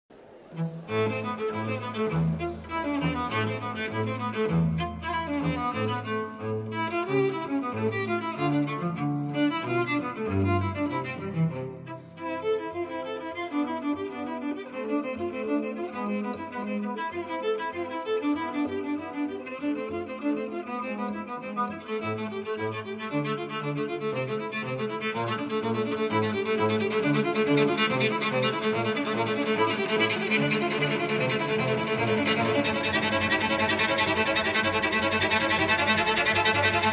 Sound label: cello